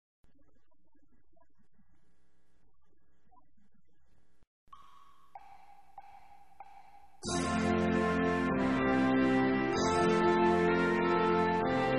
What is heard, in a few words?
trumpet voluntary